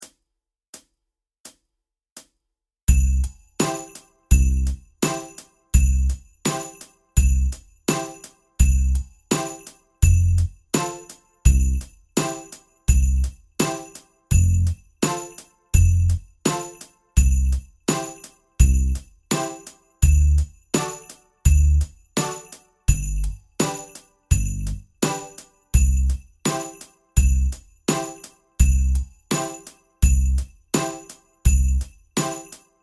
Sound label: Jingle Bells Tempo 84; Jingle Bells Version 2